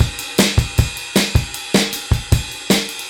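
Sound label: 155 bpm, beat loops; Zero-G; Monster Beats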